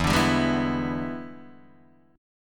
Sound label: E 7th Sharp 9th Guitar Open D, chord